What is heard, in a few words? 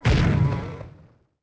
knippel 2 bort; shipcharge; Pirates Of The Caribbean